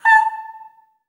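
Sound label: staccato A5, soprano, Voices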